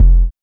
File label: kick, Heartbeats